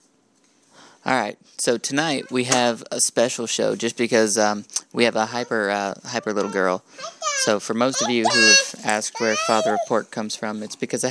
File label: singing